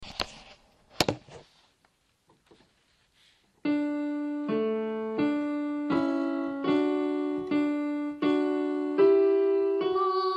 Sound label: ave verum, alto